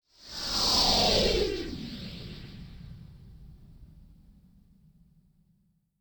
SPACE SHIPS; SpaceSounds

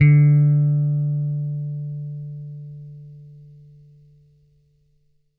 GOOD 80 FINGER D3, Bass; Bass Mace; DirectWave

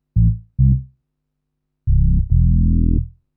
SNTH BASS TEKNO 140 A

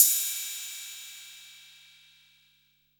808 CYM, TR-808

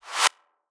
Glitch, One Shots, Glitch FX; Touch Loops; CosmicFunk

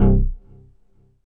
BASS STER.

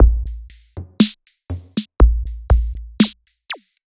Electroloop

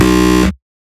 synth shot B; Electro; Anthemic; synth shots

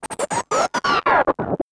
TECHNO EXTRA 140

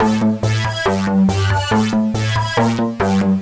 TECHNO SYNTH 140; Keys

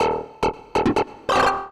Industrial 140

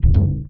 One Shot, Hits

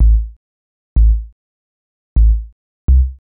HOUSE BASS 125 A

